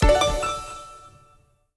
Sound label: Stage Clear Jingle; World's End Club